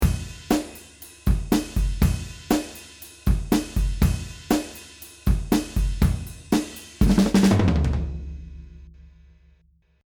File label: Drums dry